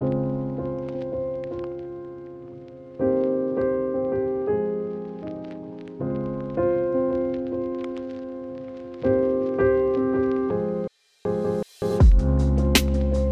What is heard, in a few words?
Crate Pianos, Lo-Fi Hip Hop; Soundtrap; Lo-Fi Hip Hop Sound Packs